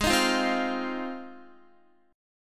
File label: Ab 6th Ab, Guitar; x,11,13,10,12,12; Ab6 strummed